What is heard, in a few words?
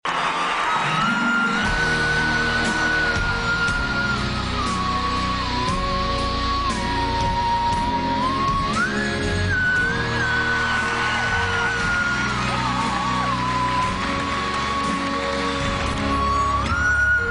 Emotional Tone